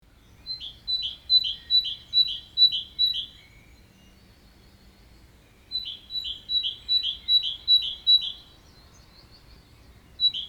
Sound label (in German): Kohlmeise Gesang Morgenruf